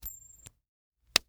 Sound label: Coin Toss Flip And Catch; Foley